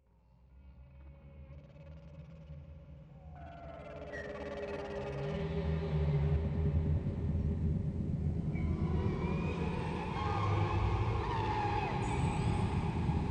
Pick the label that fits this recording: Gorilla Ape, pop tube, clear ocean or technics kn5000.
clear ocean